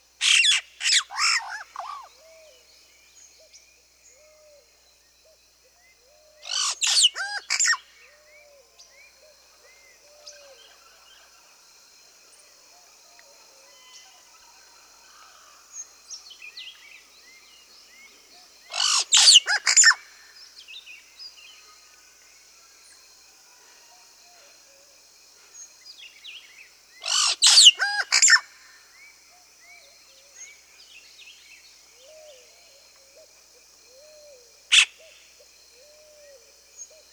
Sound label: Yellow-rumped Cacique (Cacicus cela); S. America